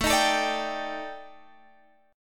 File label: Eb Major 7th Flat 5th A, guitar